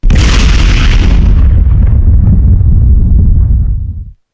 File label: nuke after, explosions nuclear